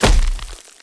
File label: BI HIT permanent aliens; Giants